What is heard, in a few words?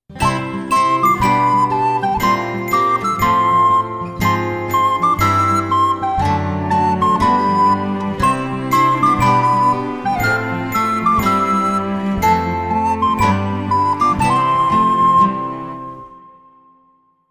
jingle